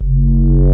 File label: warp bass, synths